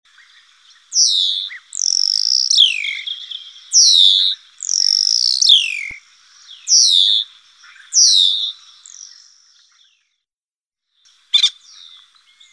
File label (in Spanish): estornino negro